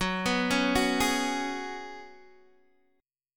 F sharp Suspended 2nd Suspended 4th, Guitar, DADGAD; chordshape; down